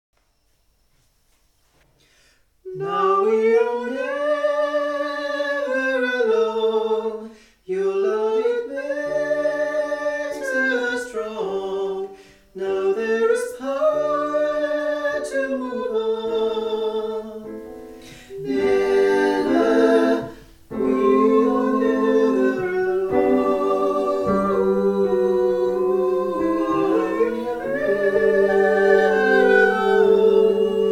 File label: tutti